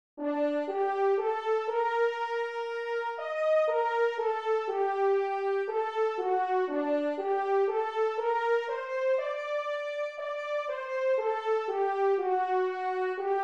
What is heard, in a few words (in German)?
sopran